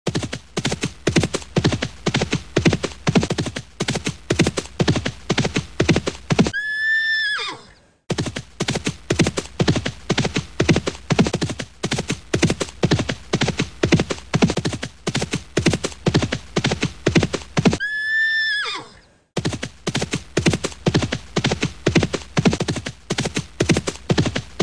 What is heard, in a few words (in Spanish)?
Caballo